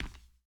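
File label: Foot Hard Wood Sneaker Walk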